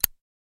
Zippo Lighter Close; lighters & matches

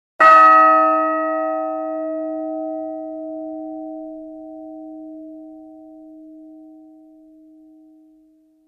Church Bell Single, bell towers

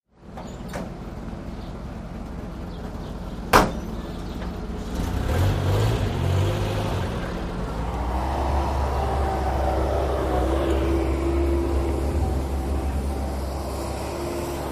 Const Site Street Repa, construction small; Construction Site Street Repair Ambience

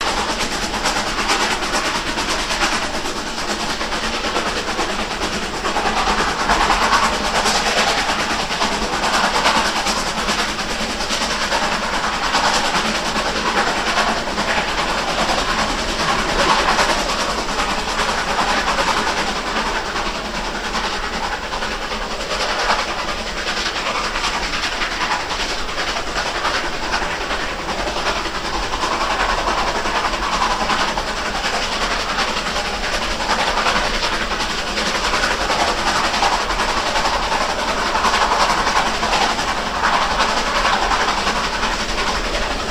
Steam Loco Daylight, steam train

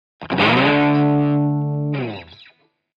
Electric Guitar Soft

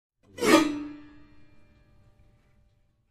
Piano Strings, keyboards piano

